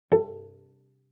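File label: Cello pizz fortissimo sul D A4; MIS Pitches - 2014; Strings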